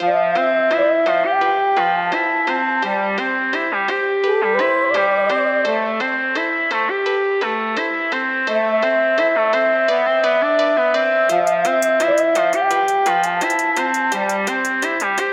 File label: emo trap type beat BPM 170 A min